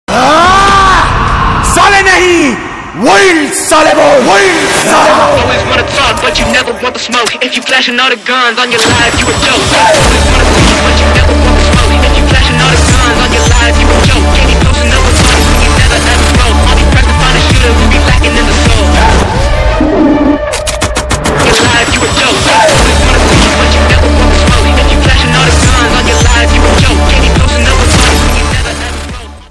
announcement bgm; mammootty; surender reddy; anil sunkara

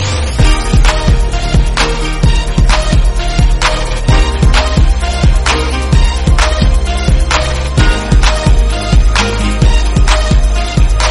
Instrumental

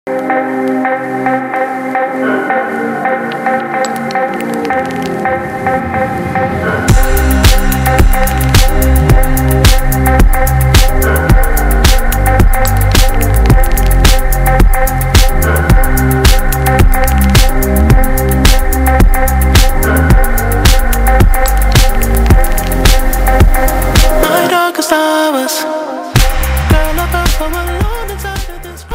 ringtone bgm; bgm s book

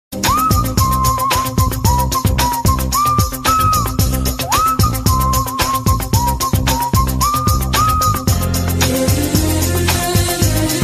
tamil love bgm ringtone

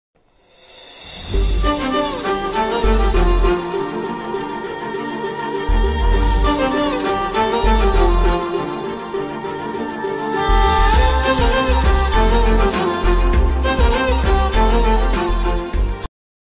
romantic bgm ringtone telugu; sir movie

